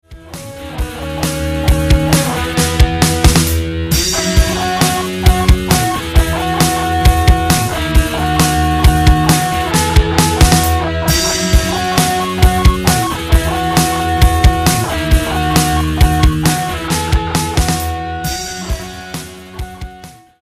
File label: rock; marquidrevived